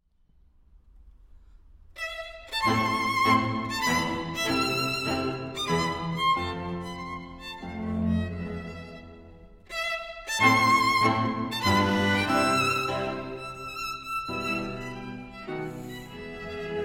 Quartet in A Minor, Andante Marcato; Quartet No. 1; Op. 4 No. 1, MS 28; I. Introduzione

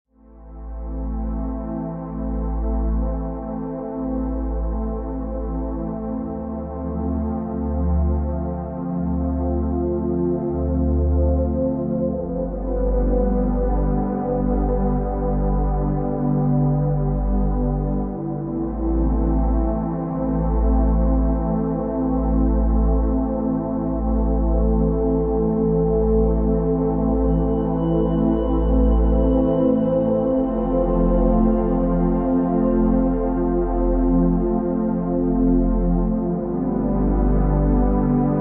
Relaxing Ambient

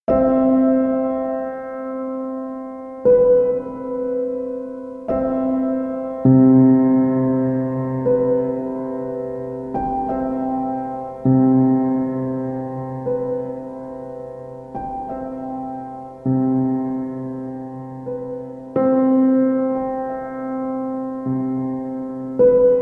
Piano Sounds

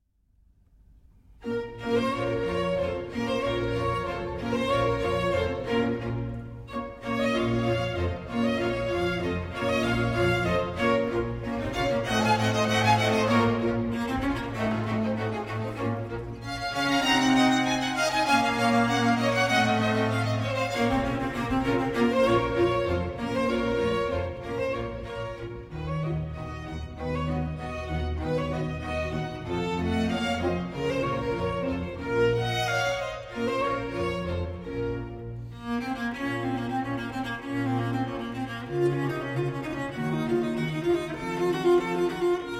Cello Concerto in B Minor, Allegro; RV424; Vivaldi; 1. Allegro Non Molto

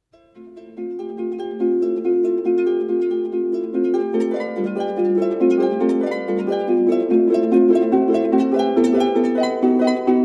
Fantaisie For Harp

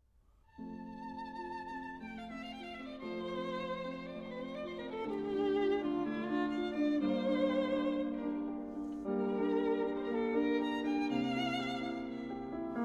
Violin Sonata No. 5 in F Major, Allegro; Op. 24 Spring

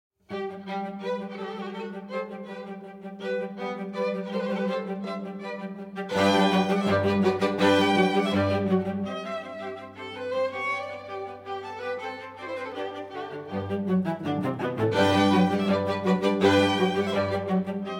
Violin Concerto in G Major, Quartet Version; Op. 8, No. 2; I Allegro

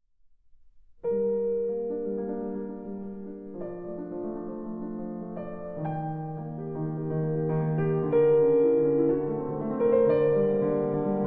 Allegro Vivace

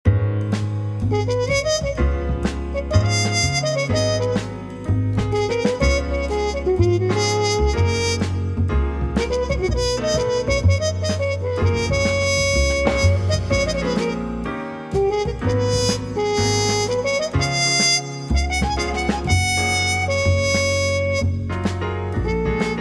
Trumpet Melody

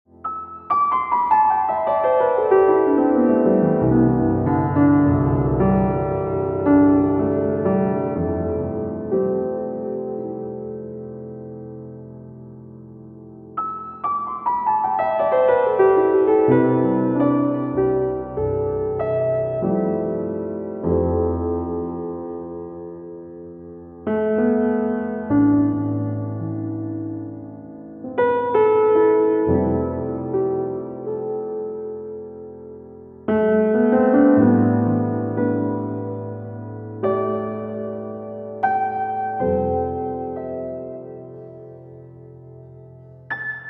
Soft Beautiful Piano

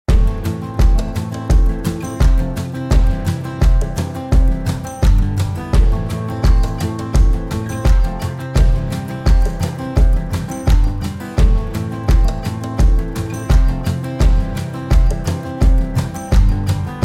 Karaoke Version; Eurovision 2022 - Armenia

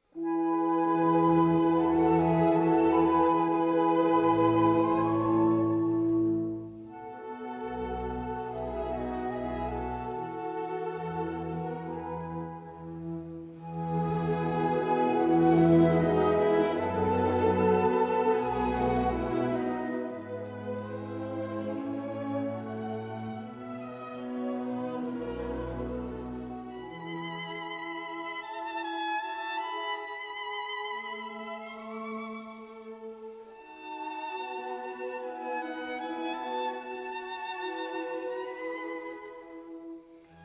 Adagio For Violin and Orchestra in E Major; Mozart

